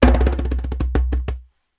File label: conga licks